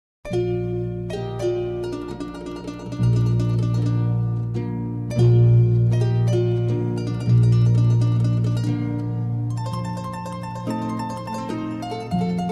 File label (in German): Indianische Floete Sopran